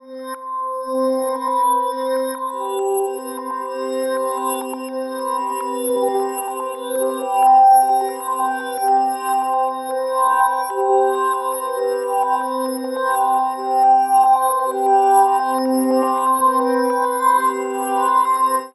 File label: Crystal Web CGB; Zero-G Ambiosis; Light